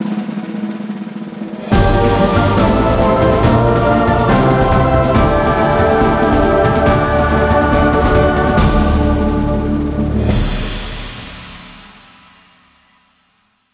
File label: winning plus; opensurge